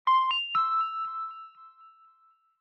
Positive Choice

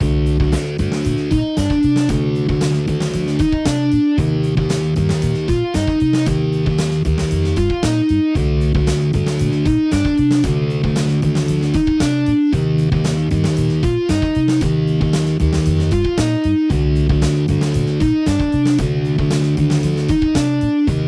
boss battle in game

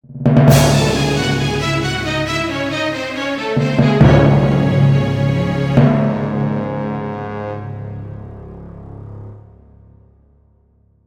dramatic event; radakan

